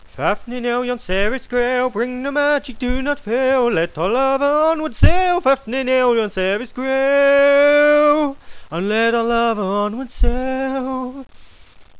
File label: Sing; Dragon